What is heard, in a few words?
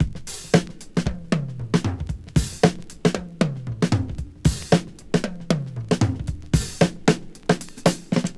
114 bpm high quality drum beat g sharp key; drum loops